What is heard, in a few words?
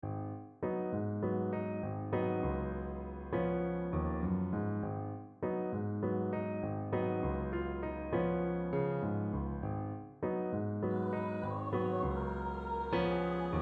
Bass; Benedictus